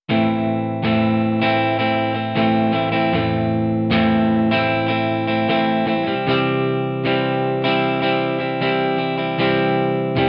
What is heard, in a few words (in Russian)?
Klassicheskij boj vosmerka bez glusheniya